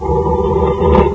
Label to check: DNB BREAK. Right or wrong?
Wrong